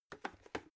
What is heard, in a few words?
bucket handle pick up